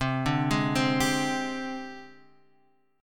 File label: Ab Major 7th Flat 5th C